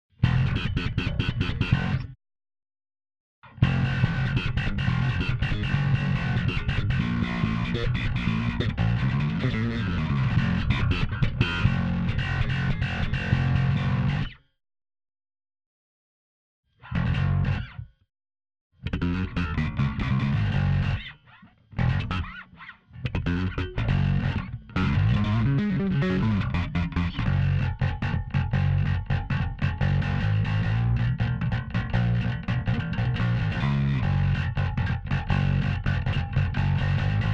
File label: Bass STEM; NCS オーディション楽曲